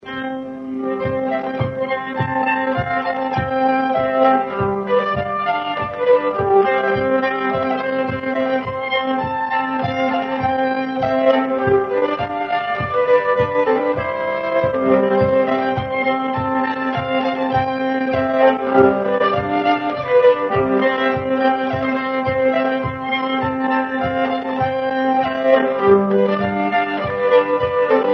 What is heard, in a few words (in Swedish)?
Slangpolska; Slangpolska M Ersson